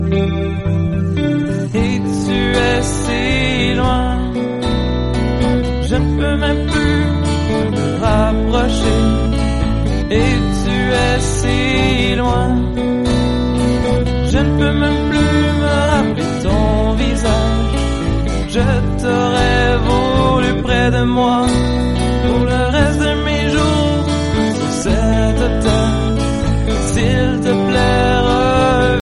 musical song tone ringtone

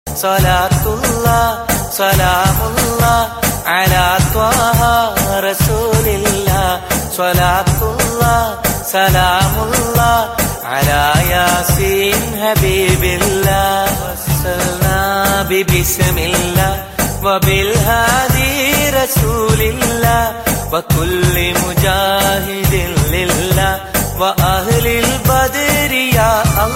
islamic ringtone; islamic ringtones

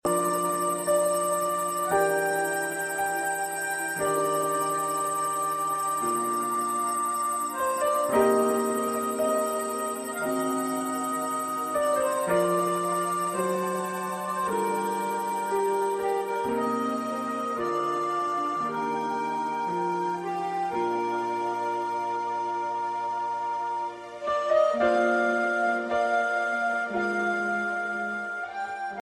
pleasing musical tone; warm summer breeze

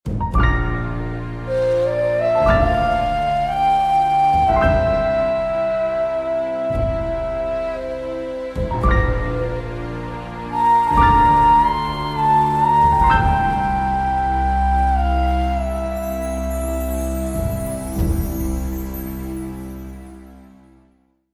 sad music ringtone

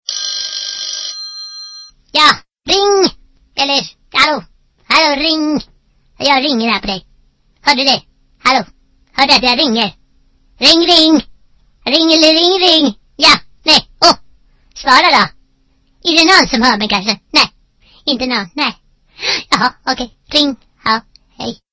ring ring ringtone

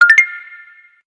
happy tone ringtone; asus

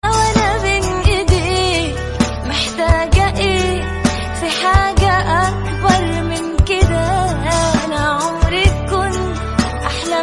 arabic ringtone

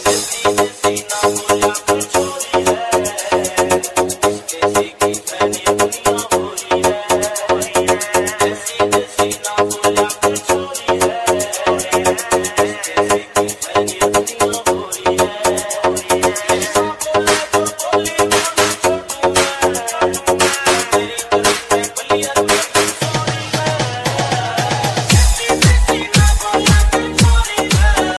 new hindi music ringtone

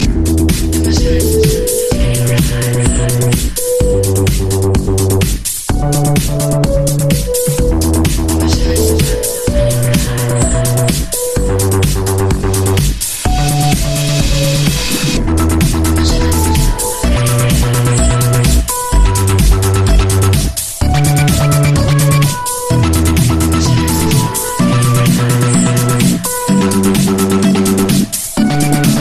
tektonik ringtone

